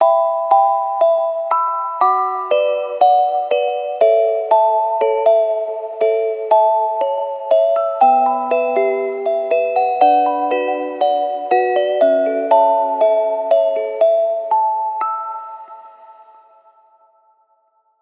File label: Short Sentimental Lullaby Trailer Celesta